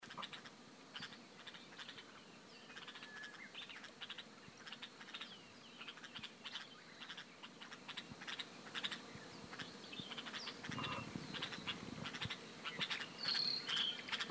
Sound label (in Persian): sedaye bolbol